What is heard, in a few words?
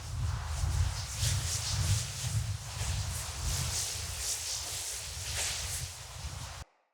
Casting Device Block Loop; Weapons; Player